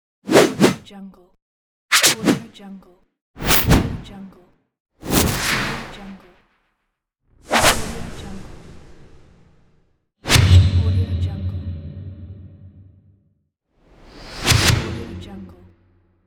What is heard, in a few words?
double whoosh